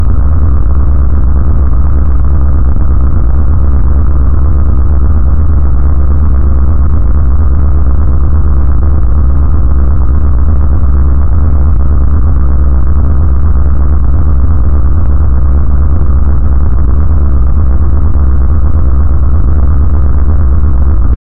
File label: cyberpunk engine sound lo